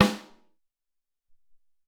RK Snare, Alesis SR-18

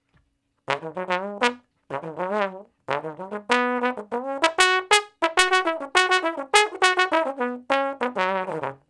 Trombone Straight (Db)

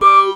boom; PlatinumPhattCD1; E-MU Producer Series Vol. 8 – Platinum Phatt; Phatt Combo

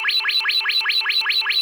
Classic FX; radiosonics; Classic Simple OSC FX

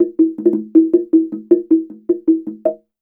SLIT DR PH, SLIT DRUM; Spectrasonics; Heart of Africa 2

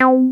303 RESO; Pro-Rec - Synthsonic

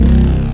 BAS, Eurotech; Sounds Good

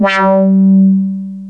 WAH; East West; Global Sounds

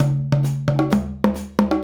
Mallet Drum and Hi Hat

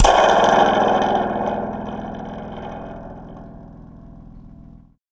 FX SWEEP

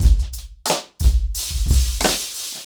90BPM, acoustic drums; The Roots Drumloops

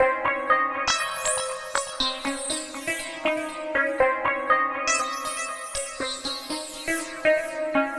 Swirl Synth, Synth Textures; Sonic Foundry (Sony Creative Software); The Electronic Music Manuscript Collection

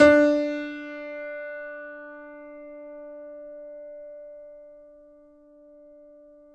Grand Piano FF D3; AKAI S6000 CD-ROM - Volume 2; Grand Piano 256M

